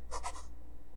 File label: write; cartographer